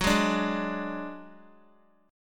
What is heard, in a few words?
Gb Suspended 2nd Sharp 5th, Guitar; Gbsus2#5 strummed; x,9,8,7,8,x